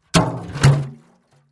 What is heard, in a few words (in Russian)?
zvuki kanistry